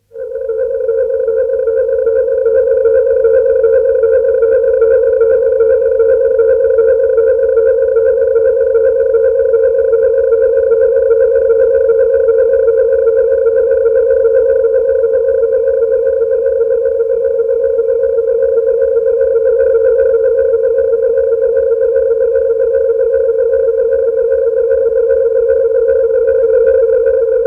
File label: Warble Frequency Notes, 250 Hz; Approximate frequency; Deep Tone; BBC